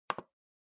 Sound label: Trackpad Click R